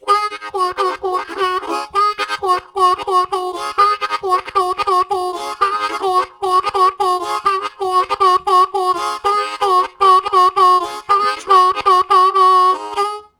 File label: Quick Rhythm, Harmonica Riffs; Zero G - Harmonica